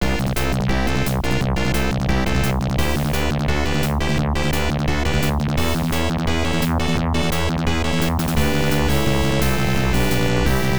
Level Up; Giten Megami Tensei Tokyo Mokushiroku; IBM PC AT

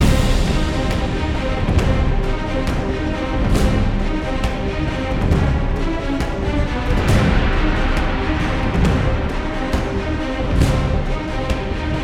Combat Low Intensity

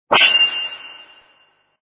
Blade sound

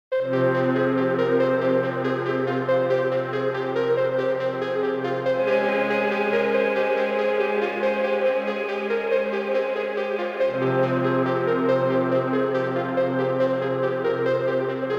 Day Stinger

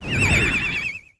Sea Taihou Out

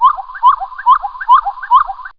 Birds; Buggy; PS1

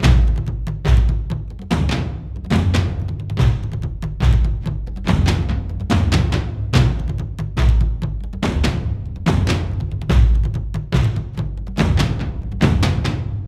Drums; For Honor

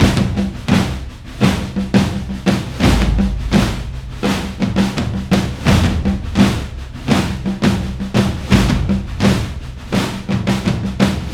Drums; For Honor

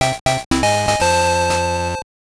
Jingle; Super Gals! Kotobuki Ran 2 Miracle Getting; GBC

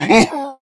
Boss Zombie Hit

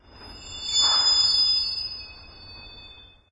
Ambient

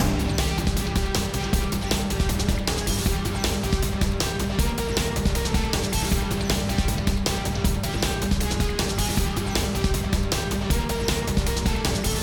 Tornado Combat MIXED; Ben 10

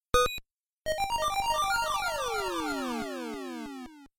Warning; Teleroboxer; Virtual Boy

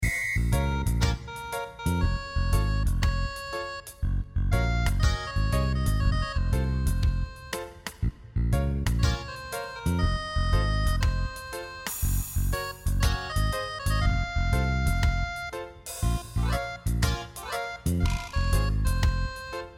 Reggae

